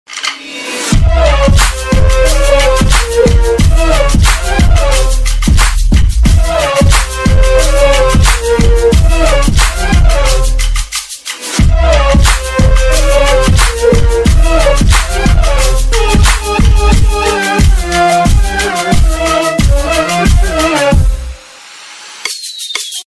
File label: ringtone